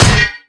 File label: arm punch generic